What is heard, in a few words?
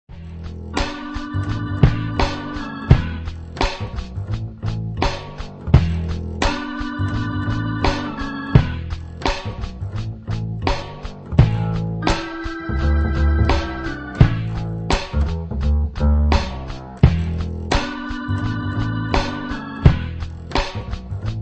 B3 Blues